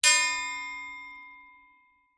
impacts metal pole or thin bar hit resonate chime

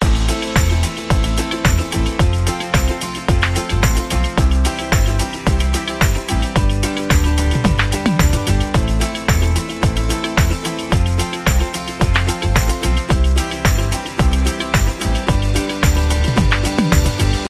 music Happy; orlamusic

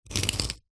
foley brick knife tip score scrape scratch line

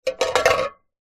food tin can fall over on surface; zapsplat

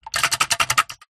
office large sticky tape holder shake

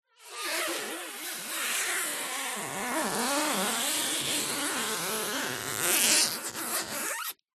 plastic tape stretched rub creak squeak; foley; zapsplat

